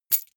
key ring large catch; objekte; schluessel

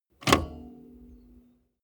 car bmw e46 interior seat lock in

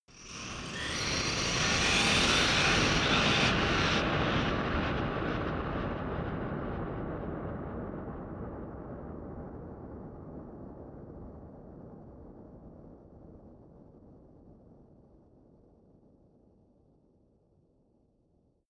eerie swell large rumble, whooshes; whooshes rises

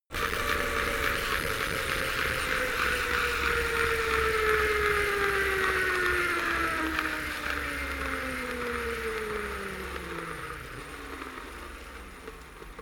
bicycle spin tire scratch metal

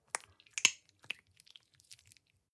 gel dispenser press squish soft; haus garten; badezimmer

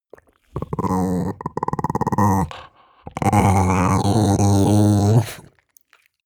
chihuahua growl stutter; natur-tiere; hunde

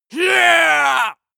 male combat attack long

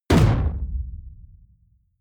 sci-fi shot tonal sub noise; schusswaffen

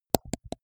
teeth clench multiple; soundpool

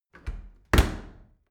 dry cleaner close soft; maschinen

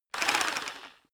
rock drill hammer rev short; bohrer; soundpool

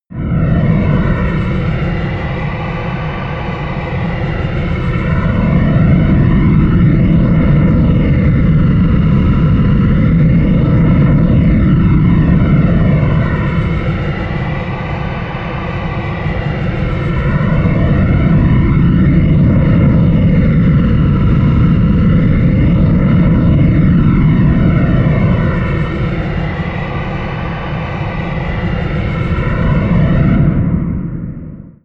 drone jet stream

